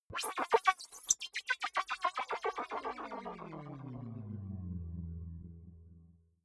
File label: alien communication short